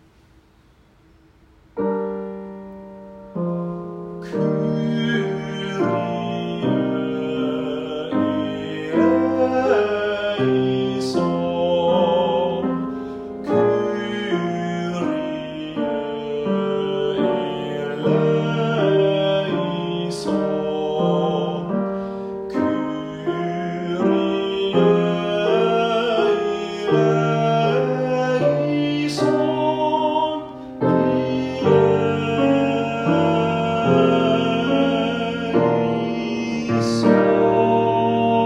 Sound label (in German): Sopran Kyrie